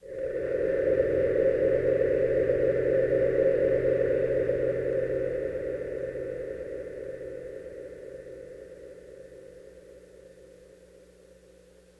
Breath Pad; Electronic Point Blank

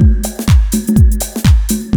SPACE P LOOP, BREAKBEATS; Crypton - DrumBase5000; BREAKBEATS#4

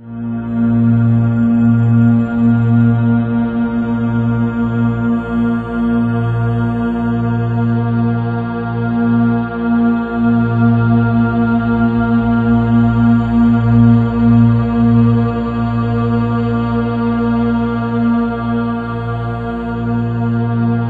Ominous Drones, SYNTH SND; USB Soundscan vol.48; AKAI

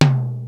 drum

